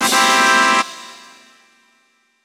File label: Success!; Banjo-Tooie; N64; Fun 'N' Games